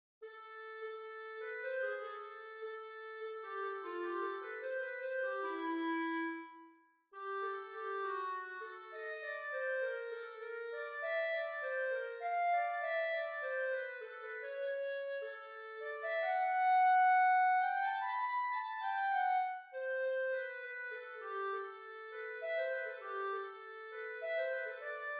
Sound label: Clarinet Excerpt